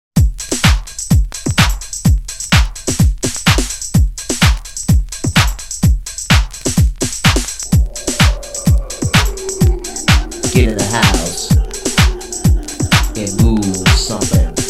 Houze Party vocals; Soundzabound Volume 3

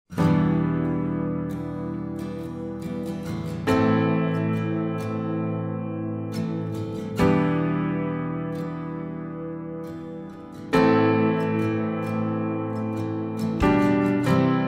70's Soft Rock; Soundzabound Volume 5

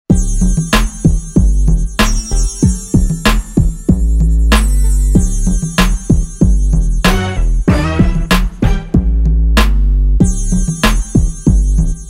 Slow Groove; Soundzabound Volume 5

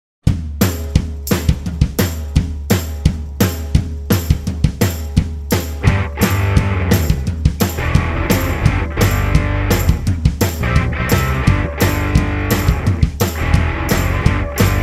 Commercial Sound; Soundzabound Volume 5 Disc 2

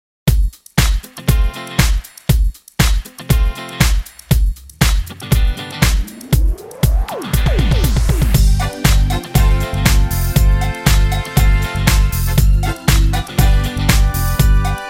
Electronic

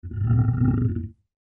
lion growl

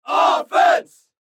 crowd offense

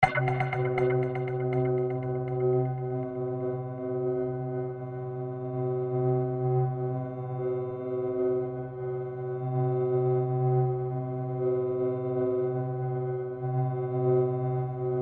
Drone Pulse

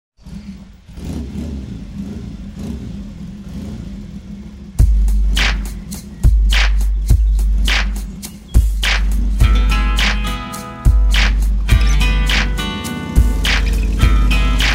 no vocals

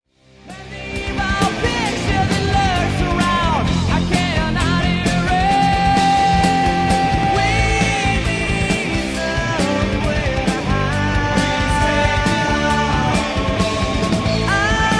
Violent Cartoon vocal

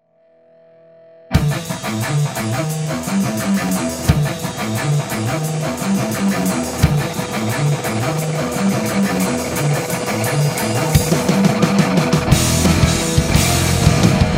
Heavy 2000; Soundzabound Volume 5 Disc 2

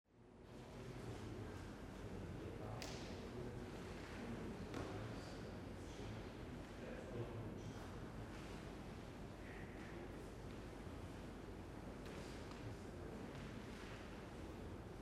Crowd

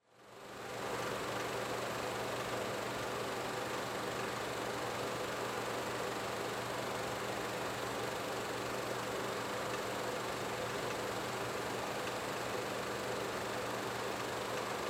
movie projector

